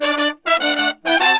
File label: 100, BRASS SAMBA; Best Service ProSamples vol.14; AKAI